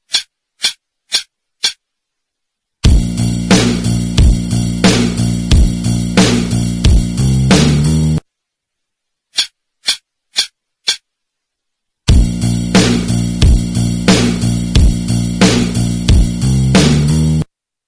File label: Intro Backing 90